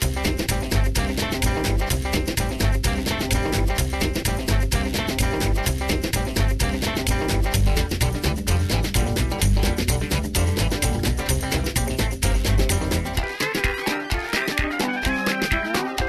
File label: ambiance country